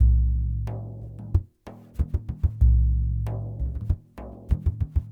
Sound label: Lg Frame, World Percussion